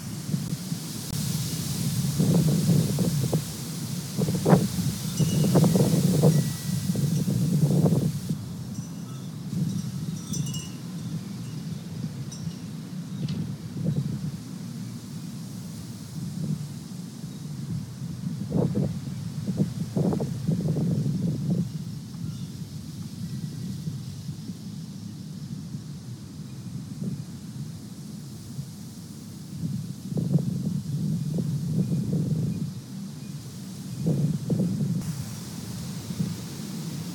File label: wind; fire